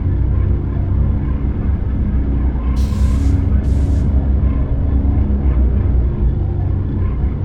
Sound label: dreadnaught idle; Stellaris; guardians